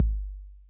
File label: Kick Mid Tone Max Decay, 808